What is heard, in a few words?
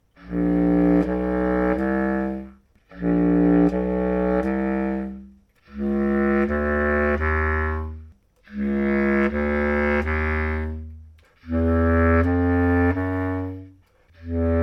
Legere EC bass vs Tenor FC, clarinet